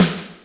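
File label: Snare Brighter, Alesis D4 drum machine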